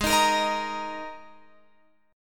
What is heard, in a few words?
Ab Suspended 2nd chord, Guitar, Open F tuning; strummed; Absus2